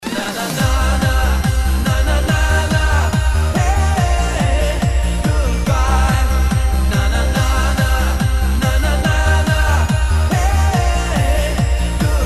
club music; taunts; props

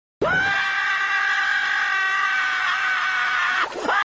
scream; taunts; props

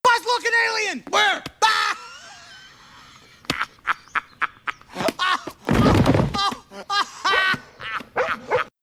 buzz alien; taunts; props

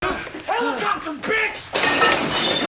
helicopter; taunts; props

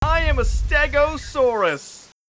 stegosaurus; taunts; props

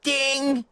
ding; taunts; props

